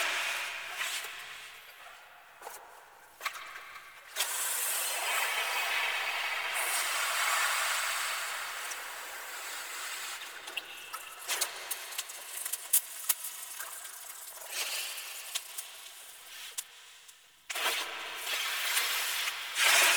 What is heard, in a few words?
Chatter Loop Large; Warframe; Ambience; Sentient Lair